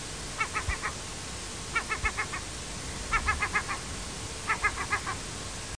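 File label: chajka, gull; Озерная чайка является представителем семейства чайковых.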